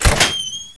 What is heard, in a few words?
door move